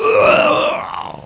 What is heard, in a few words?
Player died; Finder Dungeon